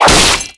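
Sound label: arrow impact robot; melee impacts; Garry's Mod; tf2; mvm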